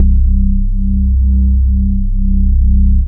Single Electric Basses, Dance Floor Bass

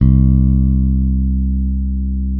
bass, sustain; Sampleheads; Will Lee Bass Library

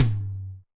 RX8 Tom, Yamaha RX-8

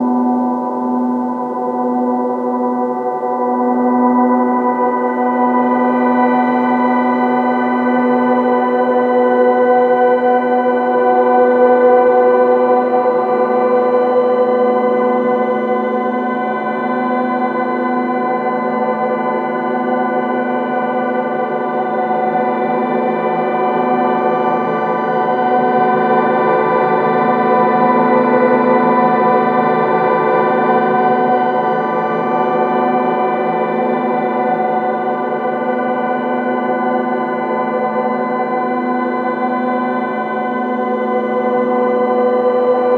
pad loop uncanny drone 135 Bmin; Virtual Riot

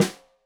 Snare Stereo, Drums; Deeply Sampled Drums; SMDrum

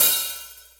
open hh, hihats

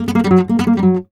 f#, Flamenco Guitar Picado; Picado 1

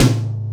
MID TOM; Ensoniq ZR-76

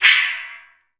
METAL SWOOOS; PERCMETALIC; Rarefaction; Poke in The Ear With A Sharp Stick

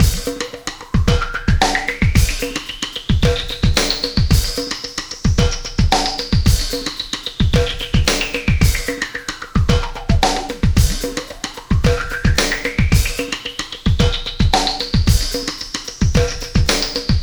Oscillation Beat

